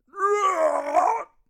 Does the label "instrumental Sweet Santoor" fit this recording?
No